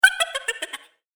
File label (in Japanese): おばけの笑い声